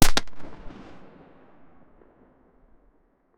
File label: frost, cannon shot